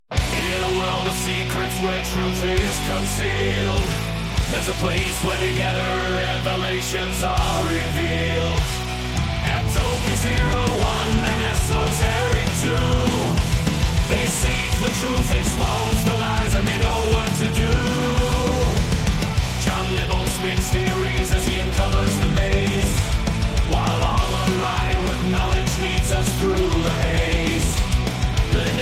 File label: HardRock